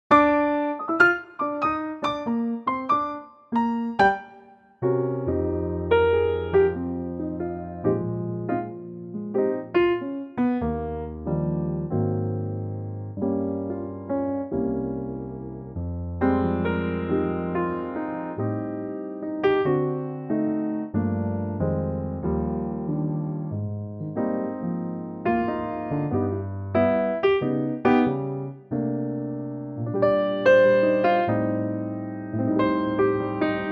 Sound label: Eb Piano; key - Eb - vocal range - Bb to Bb